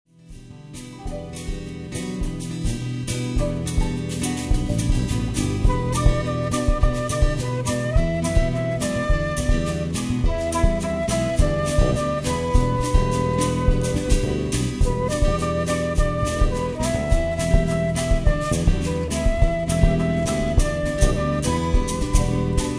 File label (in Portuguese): viola